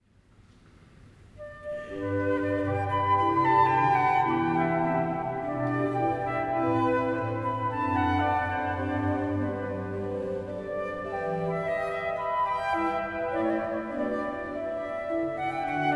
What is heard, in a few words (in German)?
Sonate G-Dur Andante